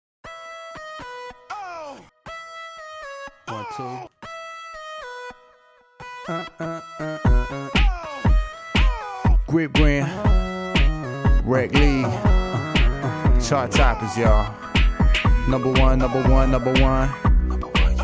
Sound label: hip hop remix